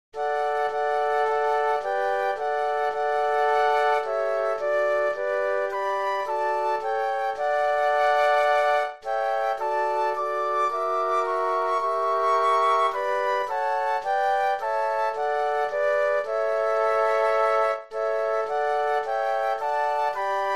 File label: Flute Quartet